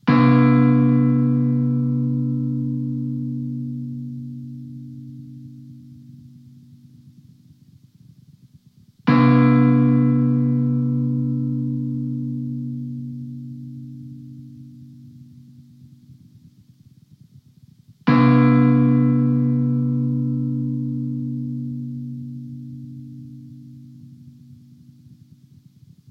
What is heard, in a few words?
Funeral Toll; Bellmaster